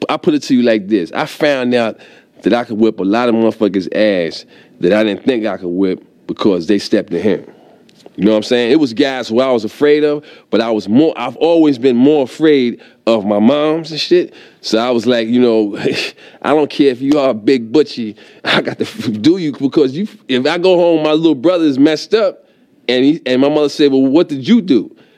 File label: kick but long